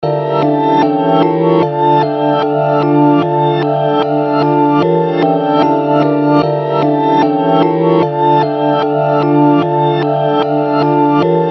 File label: Beat